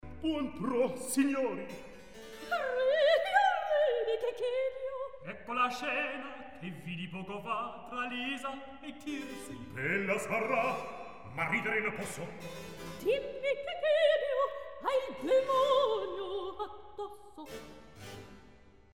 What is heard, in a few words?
Recitativo; Cairo; Lo sposo deluso; Buon pro' Signori